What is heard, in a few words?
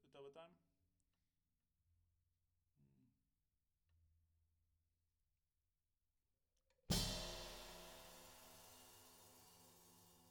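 SNR Top, snare top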